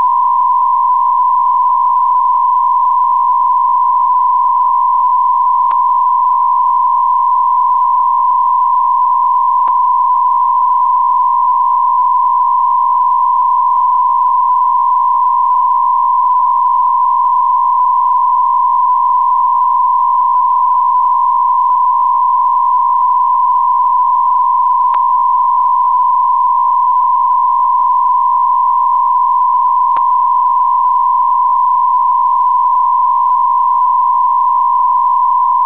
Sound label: bpsk125; F4FJP; Radioamateur